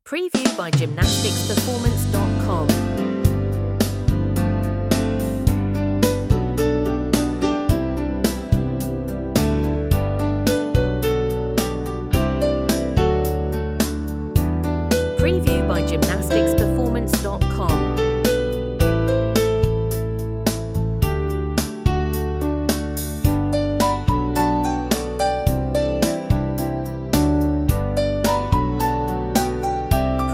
50s soft rock

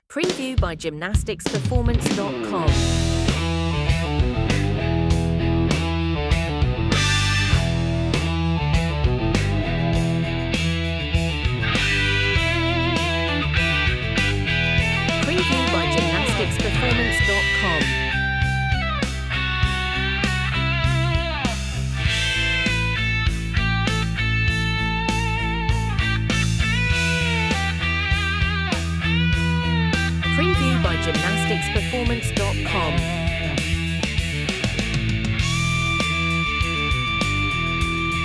classic rock

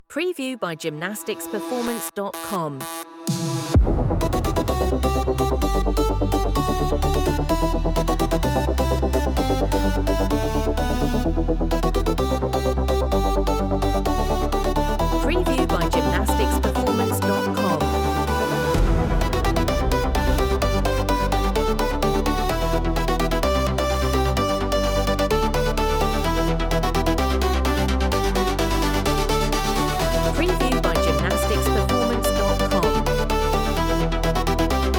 electronic dance music

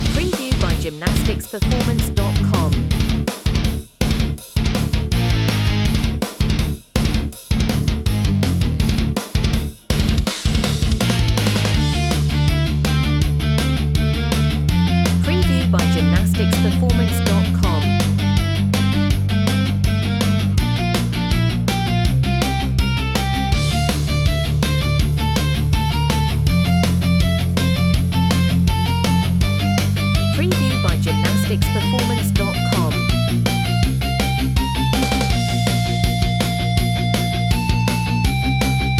metal

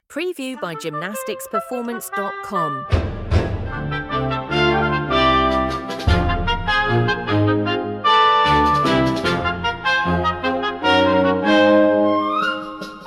double bass dance

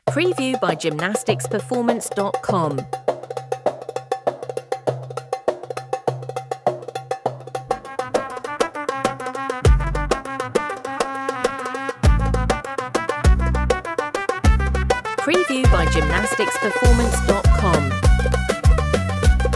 egyptian tribal

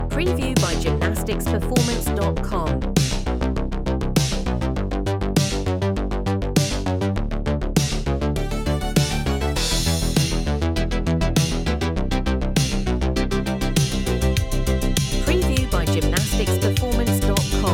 80s pop dance

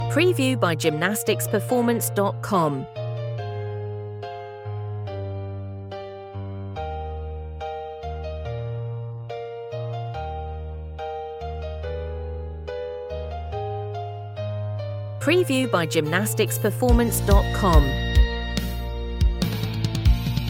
epic oboe

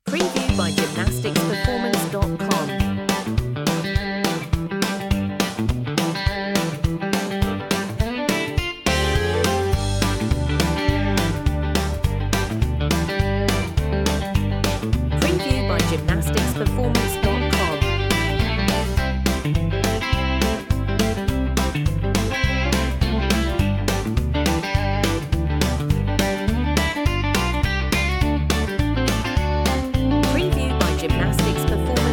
pop country

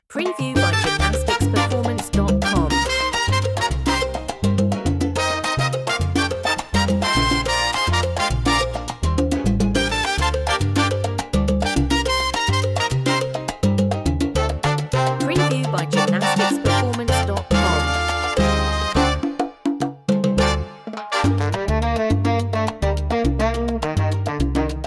latin salsa

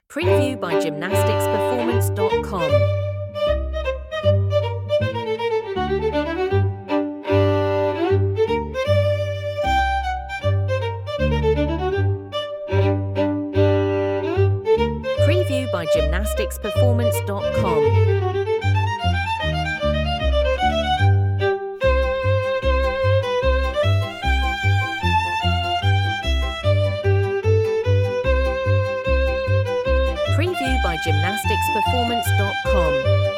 orchestral folk

